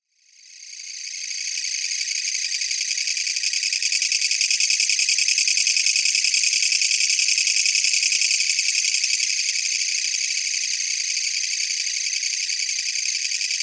kutsuwamushi; クツワムシ

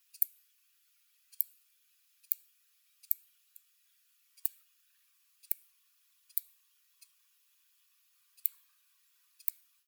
hime kudamaki male and female

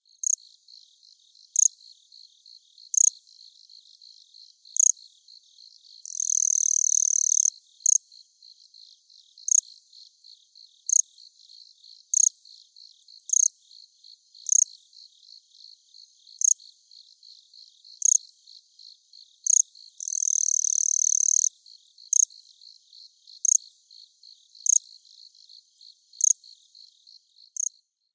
kuma koorogi

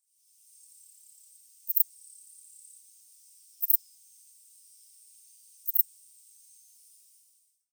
kobane himegisu; コバネヒメギス

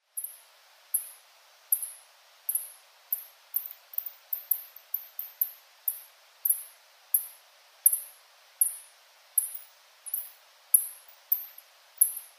bat

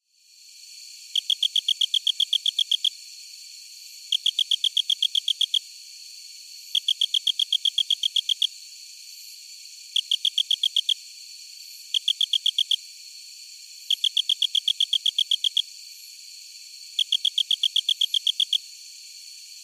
oookame

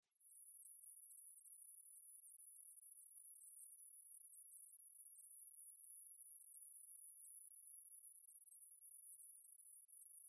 kusakiri

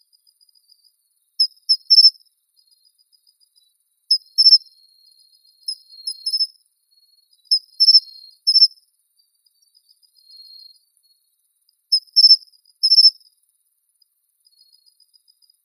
matsumushi

